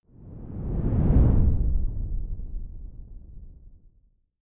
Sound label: whoosh simple low long grainy; swooshes-swishes